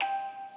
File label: musicbox